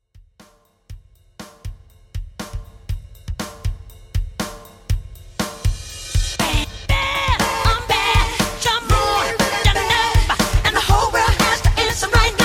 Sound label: Pop Rock Starter 120Bpm